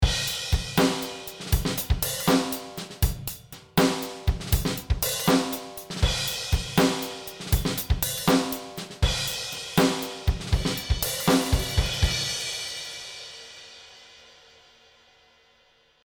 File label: Drum No Comp